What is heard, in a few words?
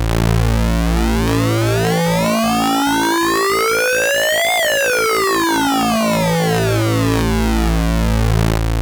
double pulse sync